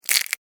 Insect Claw Crunch, Insect, Claw, Crunch, Snap, Movement, Bug; Sound Effects:Animals:Insects; Insect Claws; Blastwave